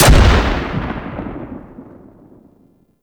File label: Sniper Rifle Low Sweetener; Warframe; Weapons; Tenno